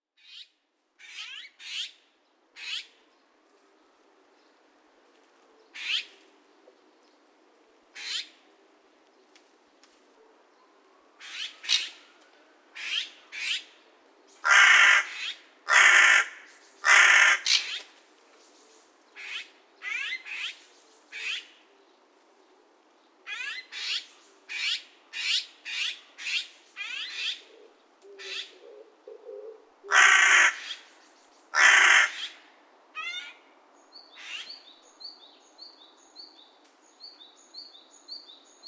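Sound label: Carrion Crow; hashibosogarasu; saitama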